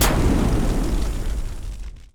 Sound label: Chemical Flamethrower M2; Chemical Weapons; Nova Sound